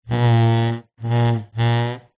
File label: household chair slide wood floor rub squeak